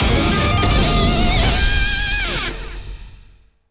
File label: large Win; netent